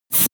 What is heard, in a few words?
air pressure release